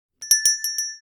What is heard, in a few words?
Notification alert chime